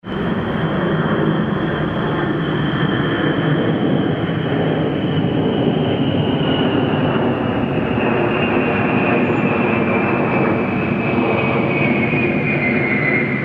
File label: b52 Flyby